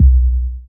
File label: BD, Dance Mega Drums, HIP